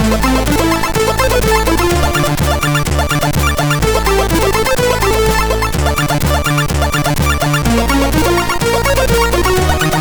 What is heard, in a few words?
Loadmusic, sid CSG8580R5